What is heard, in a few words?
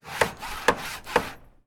Building Upgrade